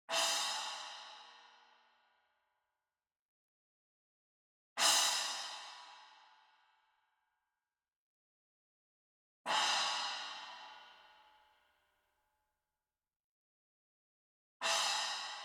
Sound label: CYMBAL Splash Hit Soft Beater Far; boom library; Cinematic Strikes Construction Kit